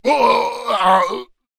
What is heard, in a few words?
Giant Death Vocals; Samurai